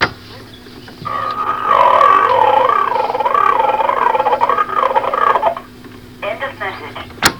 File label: arrrrrr; VOICEMAILS